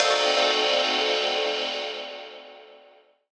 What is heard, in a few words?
RAW RIDE FX; Rawcutz - Nu Forms; Gbm